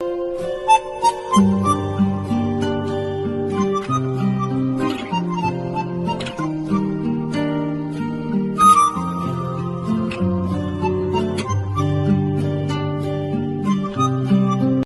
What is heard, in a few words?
Ringtone